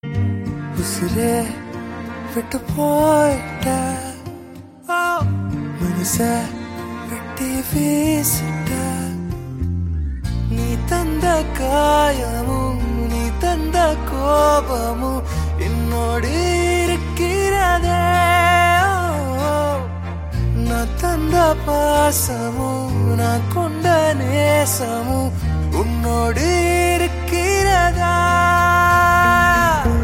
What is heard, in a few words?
song ringtone; tamil; sivappu manjal pachai